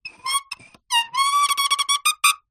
foley wood balustrade turn squeak; zapsplat

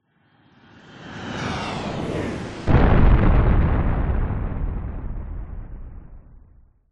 airplane jet crash explode designed; vehicles

